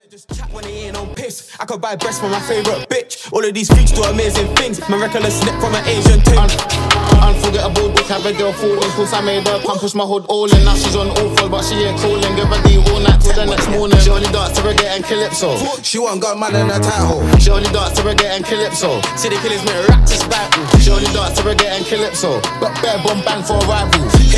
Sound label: Reggae Calypso hiphop